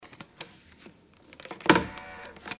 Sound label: tram ticket canceler